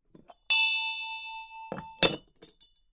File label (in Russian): Взяли и положили колокольчик